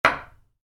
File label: Wood hit concrete, Wood, Light Impact: Concrete; Materials; Stuart Duffield